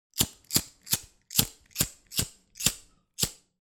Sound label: household cigarette lighter flint light 8 times quick; sound effects one